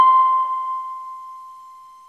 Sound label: HARP C5